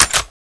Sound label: clip out; tfa cso; falcon ex